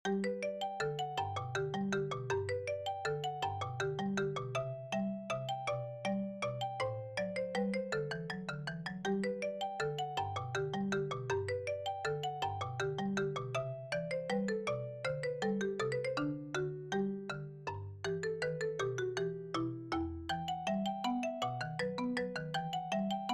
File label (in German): Menuett in G Dur; BWV Anh. 116